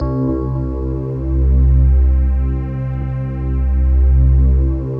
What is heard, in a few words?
BELL C, Slow Bell Choir; Optical Media International - Sonic Images Library